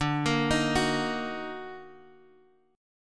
Eb Minor Major 7th D

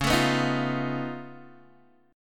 Db Augmented 9th Db down Guitar DADGAD; strummed; JGuitar

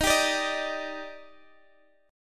D sharp Major 7th, Guitar, Half Step Down

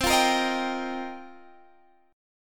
F 7th Suspended 2nd Suspended 4th

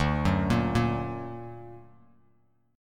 D Augmented D down Bass Standard; D+ arpeggiated; JGuitar

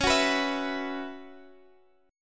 Gb 7th Suspended 4th Db, chord

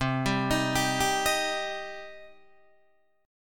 C Minor C down Guitar Open D

